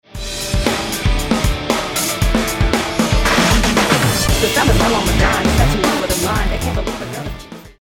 fill arrange

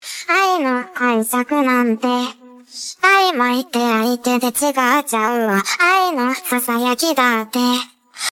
vocal

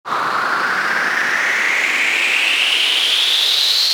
Uplifter

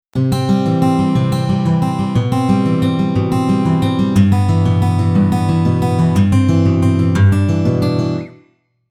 real guitar

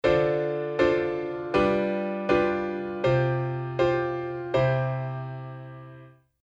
Dm7 G7 Csus4 C

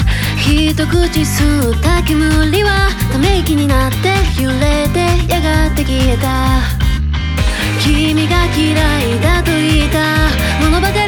Bass

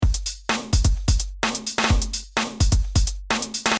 drum korg

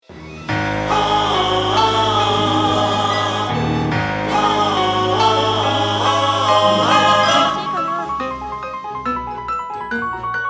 orchestration

